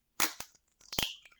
egg cracking; fowl-play; slingshot

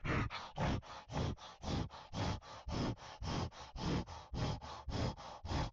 wolf breath; fowl-play; boreass; enemies

